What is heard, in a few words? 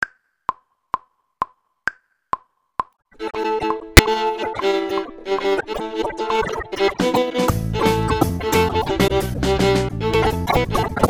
viola